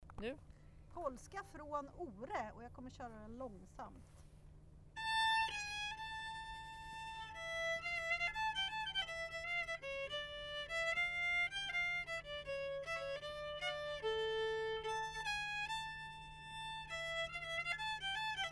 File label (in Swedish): Storpolska från Ore